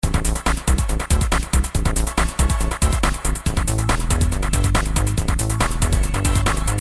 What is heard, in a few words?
music old